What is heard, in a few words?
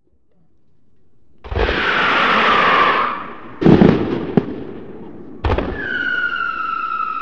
Fire Work